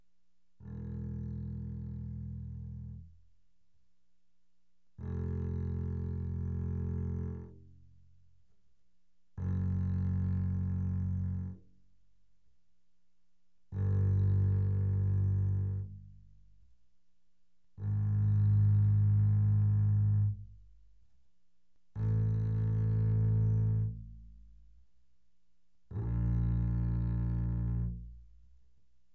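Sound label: Bass arco mezzo forte sul E E1 B1, doublebass; Strings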